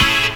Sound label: KEY, Synth FX Misc